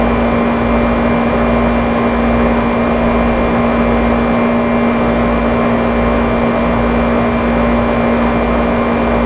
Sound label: amb, ambience; caged